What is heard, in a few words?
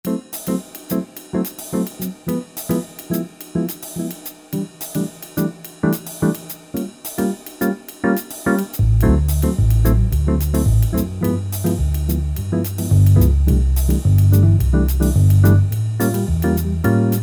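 Jazz Bass Guitar, synth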